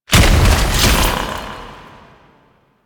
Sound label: Fusion Pillar Impact; Warframe; Qorvex